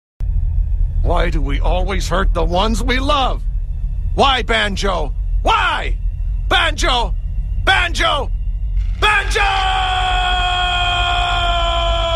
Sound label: banjo